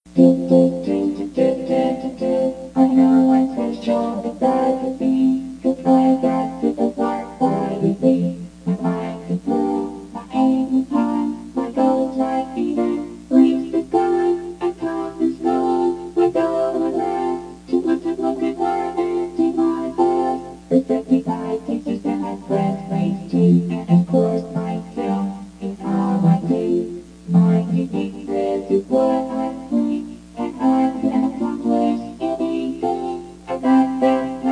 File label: creed synth